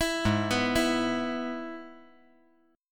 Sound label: G sharp Minor Sharp 5th; G#m#5 arpeggiated; JGuitar